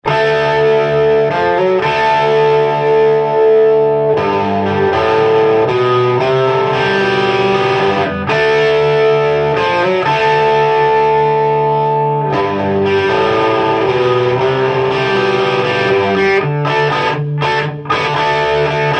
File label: marshall 1959, amps